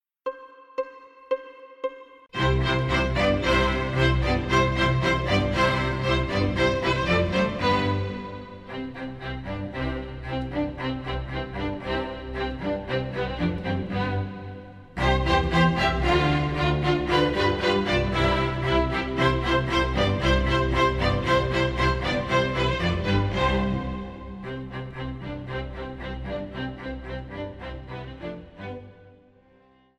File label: piano